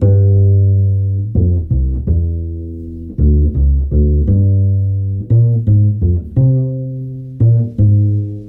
bass

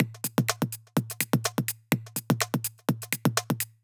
Drum loops; Just Add Drums

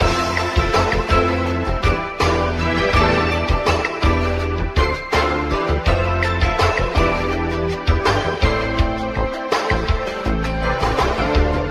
keys, Phonky loops

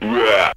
growl, Voice

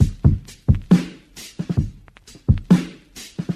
Drum loops, Boombap

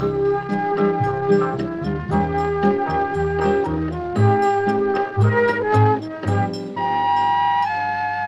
flute, Jazzmatazz Loops

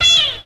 bee cartoon; ooh ahh

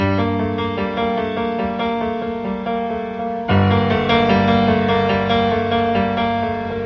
piano slow down, sad; Loops

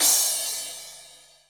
Cymbals

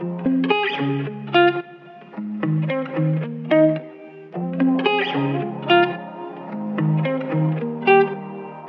guitar funky, loops; Phonky

